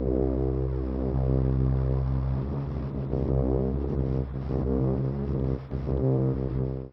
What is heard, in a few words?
Simple Keyz Trumpet C1